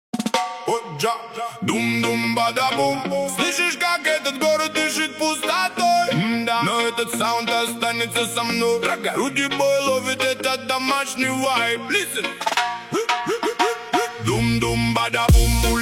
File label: deep atmos deep bass ragga rap mix